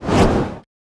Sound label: BOSS Atk